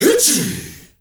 MALE GRP, SHOUT; Heart of Africa; Spectrasonics; Heart of Africa 1; CH. SHOUT2

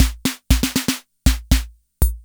FILL, KR55; INIS - Funky Xtreams

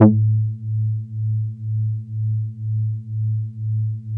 Moog Taurus, A2; Big Fish Audio - First Call Vintage Keyboards

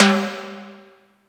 Timbale H, Yamaha PSR E305

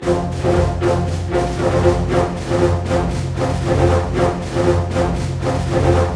120 READY, Scoring Tools ORC Ready Fight; East West

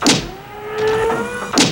STOMPER; Northstar; The Wizard VOL-1

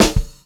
126 CL SD L, Pop-Rock Drumloops; USB Soundscan vol.37; AKAI; 90 s Sample CDs

